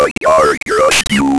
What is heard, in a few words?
Game Over